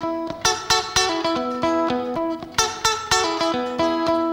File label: TWNSHIP, S.AFR.GTR